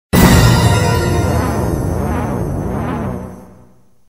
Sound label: Sad Stone